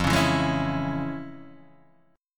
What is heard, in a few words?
F Minor Major 7th Sharp 5th Guitar Open D